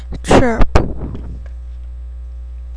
chirp

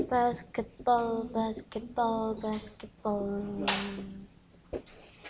basketball

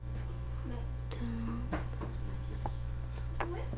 drum

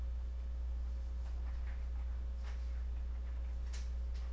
throw

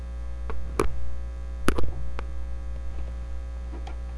sharpen

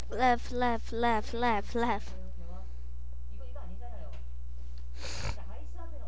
laugh

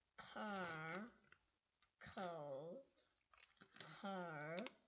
car

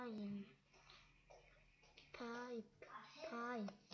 pipe

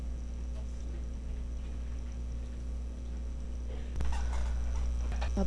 success

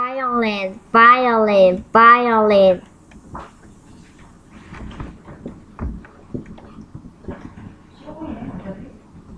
violin

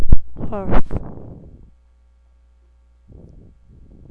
horse

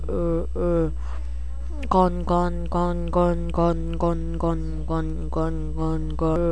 gun

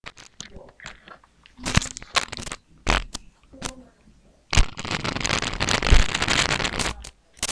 horse

